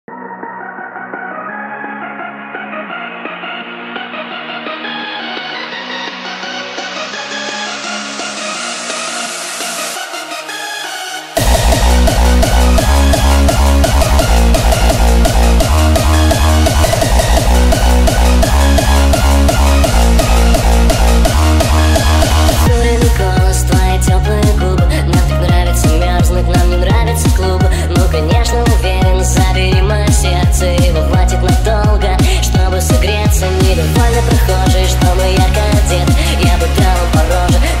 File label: hardstyle remix